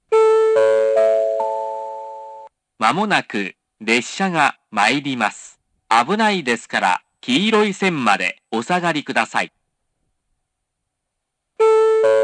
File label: nittaki 1 bannsenn sekkinn